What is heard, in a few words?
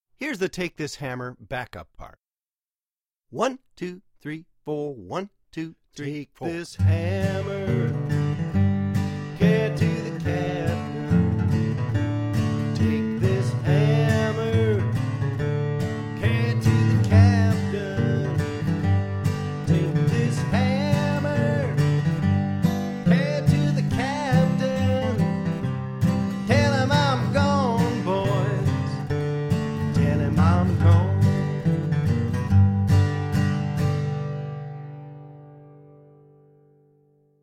Take This Hammer Gtr